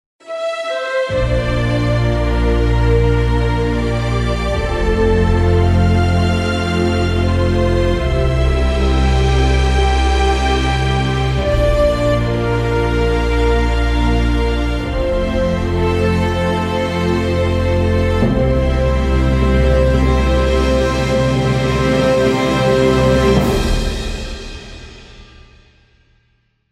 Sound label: stinger; Sky Moves (Stinger C); M. Sander